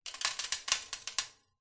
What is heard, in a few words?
stones gravel small sprinkle handful into metal container; foley; zapsplat